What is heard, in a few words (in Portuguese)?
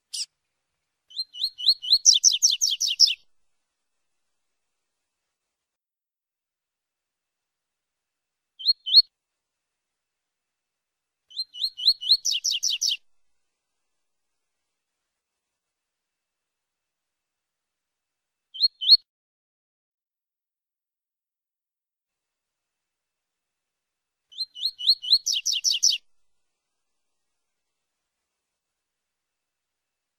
Canto Coleiro Baiano Tui Tui Zel Zel